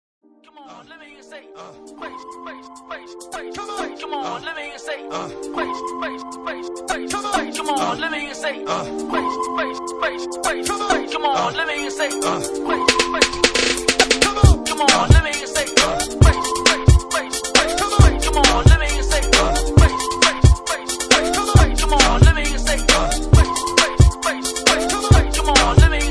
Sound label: Miami Bass